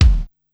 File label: Ambi Kick, Korg Wavestation-SR; Single Hits; Waves 456-484